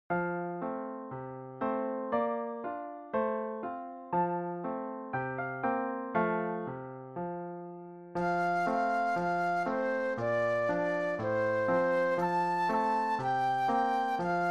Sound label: Old MacDonald Had a Farm Flute Solo